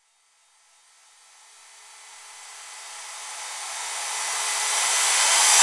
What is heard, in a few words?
Reverse Cymbals